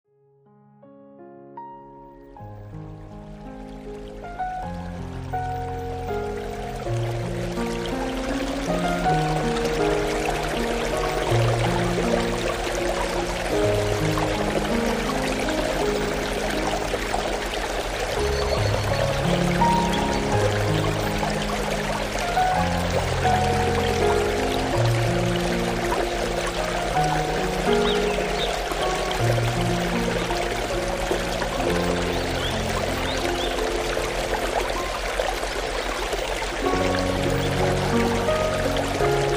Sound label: relax stream